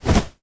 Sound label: foley shorts cloth throw